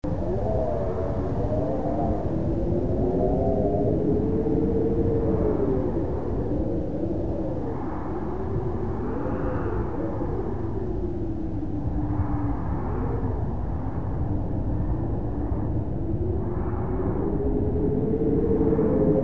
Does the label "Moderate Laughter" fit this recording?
No